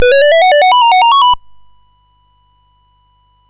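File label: Mountain King, MOS 6581 SID chip music, games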